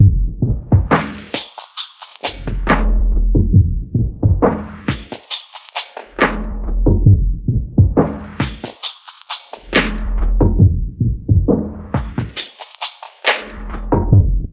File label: filters, Dark Side Of TripHop; USB Soundscan vol.35; AKAI